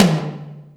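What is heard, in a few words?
Md Media, Alesis D4 drum machine tom; 300 Drum Machines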